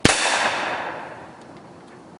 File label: Civil War Caplock Rifle